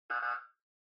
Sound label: OS Sound (Speaker-Notification)